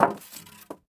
Styrofoam Hit

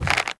Turn

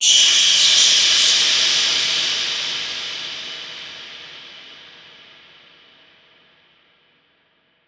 Bats

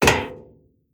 Metal Run; moul-assets

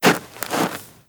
Dirt Turn

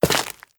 Stone Walk

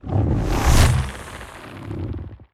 Puffer; moul

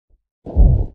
Sandscrit Idle to Walk

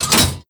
Elev Lever end

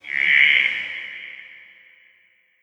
Forest Amb; moul-assets

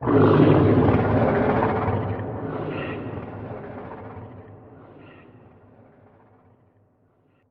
Creature

